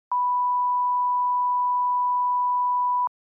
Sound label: Censor Bleep 1kHz Long